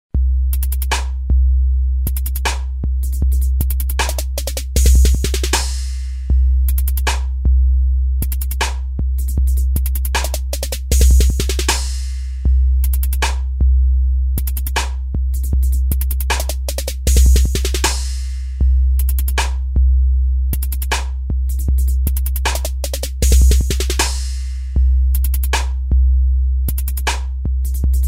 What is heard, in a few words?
beat 78 bpm